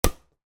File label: sport ball soccer bounce hard concrete ground